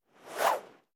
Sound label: Come Here, creatures pets